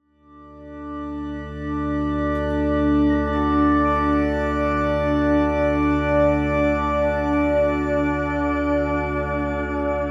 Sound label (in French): Orgue; organ